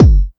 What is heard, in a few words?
natural kickdrum sound g key, Royality free kick tuned to the G note.